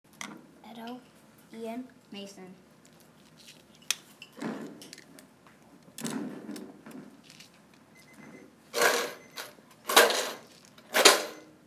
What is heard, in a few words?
found instruments